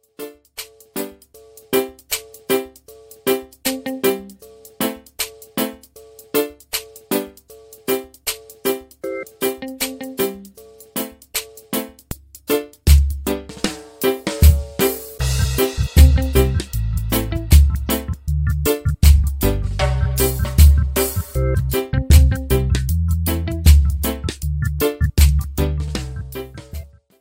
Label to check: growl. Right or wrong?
Wrong